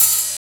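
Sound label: open hat; Funky Ass Loops; East West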